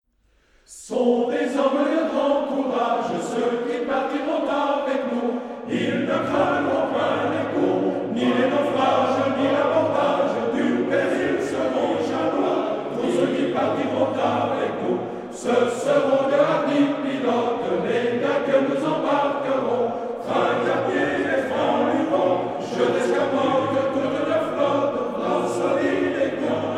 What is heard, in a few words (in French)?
chant de corsaires